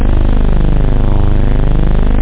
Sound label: Deep Bass; bomb